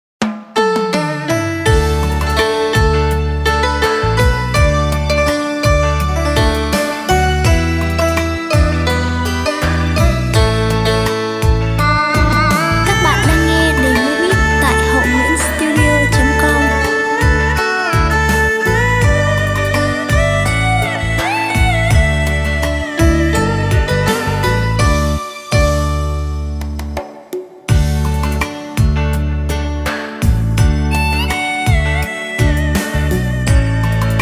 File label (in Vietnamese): beat